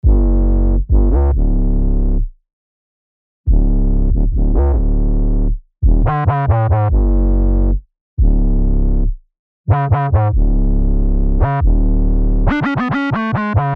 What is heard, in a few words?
Drill 808 Fm 140 BPM